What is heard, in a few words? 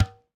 Tennis Racket Ball Hit Light